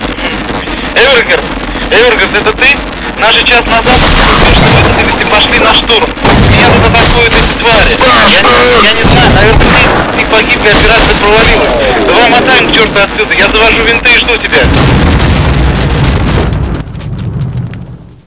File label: radio